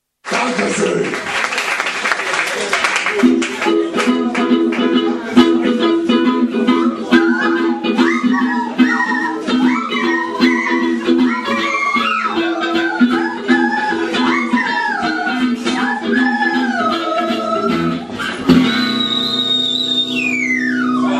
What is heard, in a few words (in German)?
nasenfloetenorchester; Noseflutes